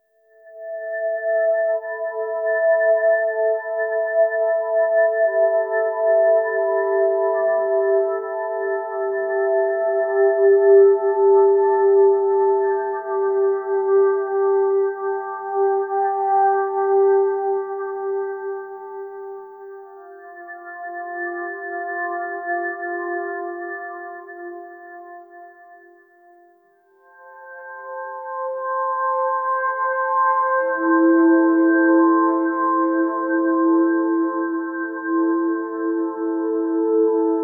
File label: calming sound for a prese, presentation